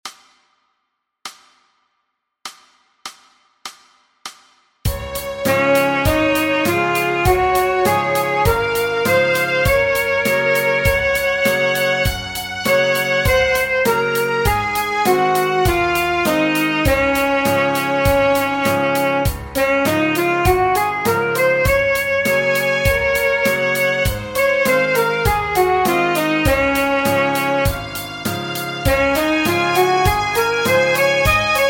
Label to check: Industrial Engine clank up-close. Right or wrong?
Wrong